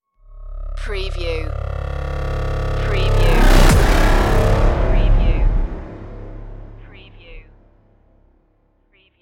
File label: bionic animal stinger